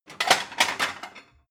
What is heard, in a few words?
plate multiple take hard